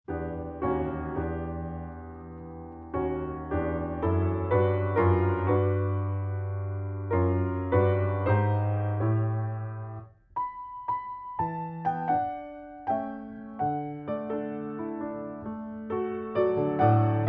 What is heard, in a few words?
Piano